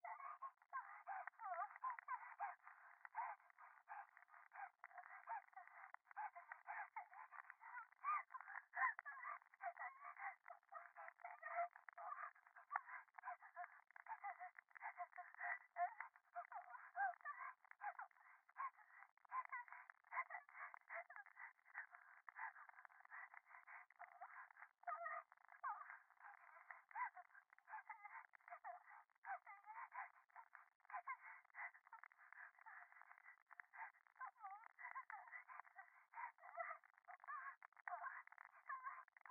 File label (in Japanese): 電話 可愛い喘ぎ 暖炉